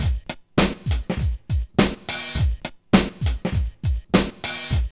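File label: 102 bpm, Funky loops; Zero-G; Funky Elements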